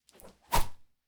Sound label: sling attack